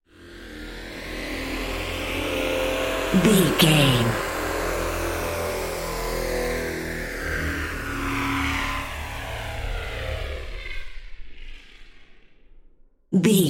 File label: ominous war plane heard courtyard